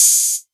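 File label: 808 Hat Open; Novation DrumstationV1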